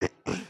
sleep turn; emotes

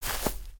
Footstep Grass; creatures pets

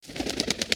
Spider Shake; creatures organic

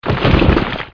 rock pull; creatures organic

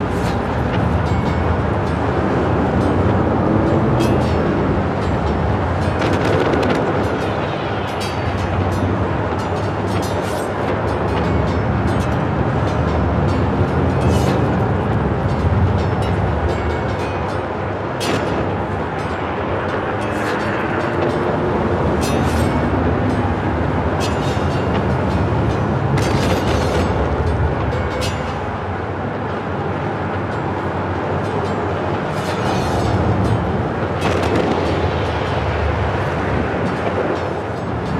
Ambience Siege Field; LU; ambience ninjago

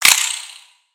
Spider Get Hit

